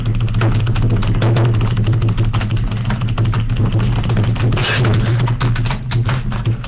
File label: loud keyboard spamming sound; speedrun